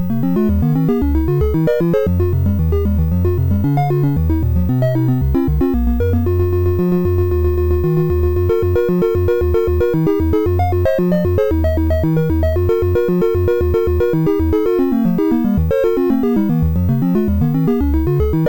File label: sid MOS6581R3; Boulder Dash